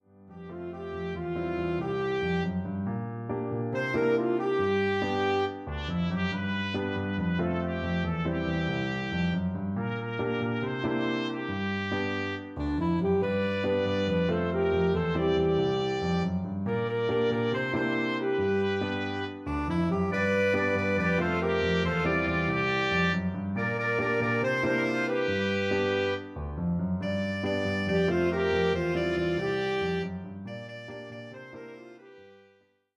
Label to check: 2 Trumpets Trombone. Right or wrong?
Wrong